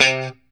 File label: POP C 3, STRAT guitar; Spectrasonics; Hans Zimmer Guitars VOL-1; JC-STRAT POP